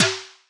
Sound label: gritty industrial snare one shot